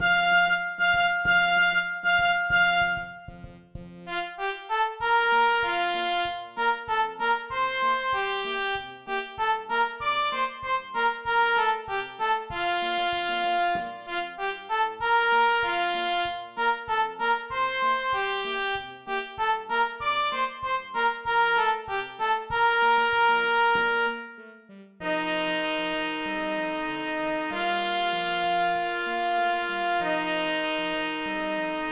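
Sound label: trumpet flute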